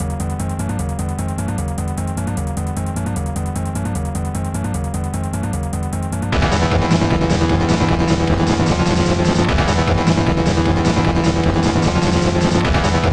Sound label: crashed